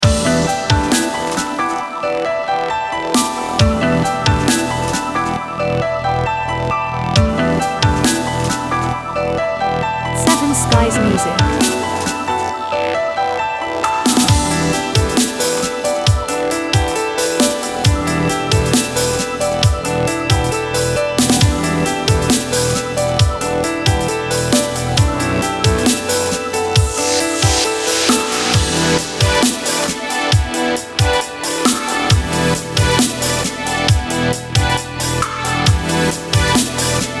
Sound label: Chillstep Horizon